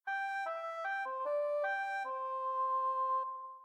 baby bear sad

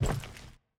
Concrete Soldier Crouch, Footsteps; Levan Nadashvili

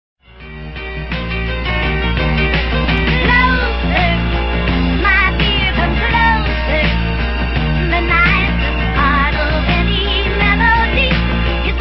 rock

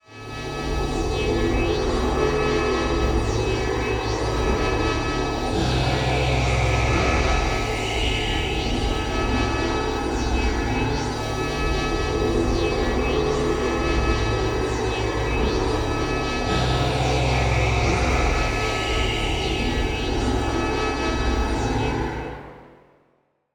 Drone Atmosphere Sci-fi; MatiasMacSD; INSIDE ATMOSPHERIC ELEMENTS

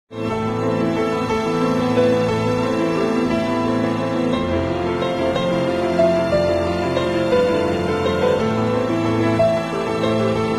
piano; Nyman

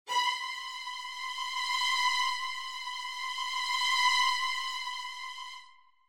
THE SOUND OF FEAR

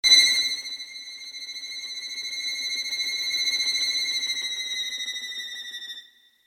Sound of Fear

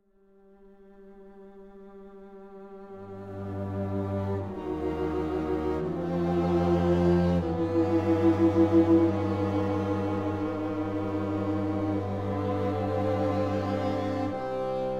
String Quartet Largo; Dmitri Shostakovich; String Quartet No. 8

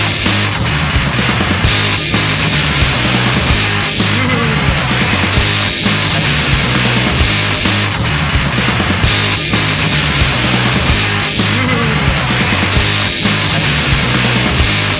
Grunge Simple

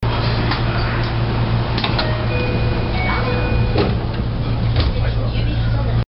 door music

